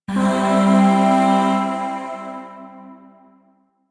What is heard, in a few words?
Shawzin Pent Maj Chord G; Music; Chords; Warframe; Zariman Void Shawzin; DE